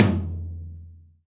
TOM, Roland, Real Kit

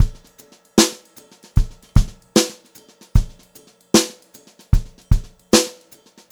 Brushed Drum Loops

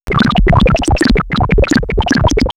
Bubbles, synth fx; StarTrek; X-Static Goldmine

